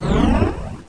ring wind; The Lord of the Rings The Two Towers CD Cardz Rohirrim

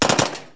45 cal smg, guns; KABOOM! - Wild and Wacky Sound Software; citylife

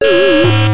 wrong; Let's Discover Michigan!

